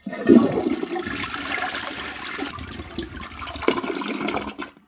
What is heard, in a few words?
TOILET FLUSH